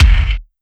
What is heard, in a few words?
BD Industrio, DRUMS; Polyend; Legowelt